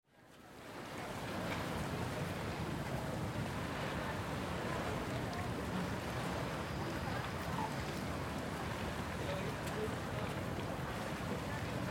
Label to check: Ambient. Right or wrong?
Right